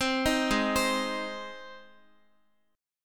Ab Major Ab down Guitar; Ab arpeggiated

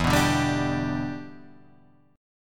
E Minor 7th, down; strummed

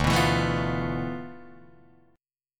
D Minor 6th Add 9th, Guitar, DADGAD

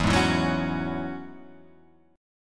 E 6th Add 9th E down Guitar Half Step Down

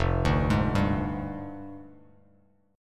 F Minor 6th Add 9th F down Bass Standard; JGuitar

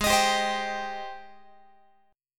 G sharp Major 7th G sharp, Guitar; G#M7 Chord

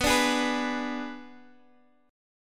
B Minor 6th B; Bm6; strummed

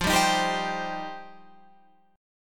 F 7th Suspended 2nd F down Guitar Open F; F7sus2; strummed

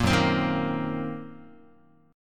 Gb 6th Flat 5th Gb down Guitar

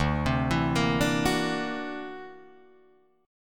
D Augmented 9th down Guitar Drop D

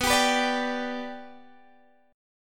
B Minor Sharp 5th, Guitar, Open D

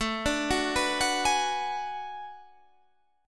B Minor 6th A; Bm6/A arpeggiated

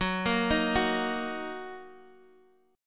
F sharp 6th F sharp

